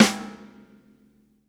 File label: Snare Medium; ACID MUSIC; Drums; One Shots; Noble & Cooley